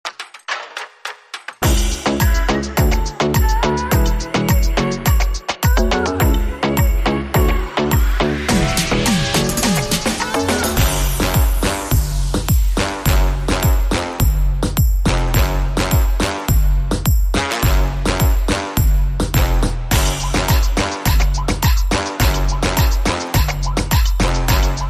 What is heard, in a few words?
Kingston Dancehall